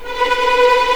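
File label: B4 TREM P VL, violins tremolo; East West; The Ultimate String Collection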